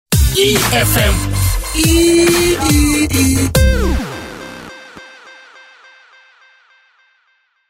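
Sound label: jingle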